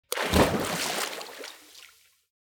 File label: heavy splash; soundscrate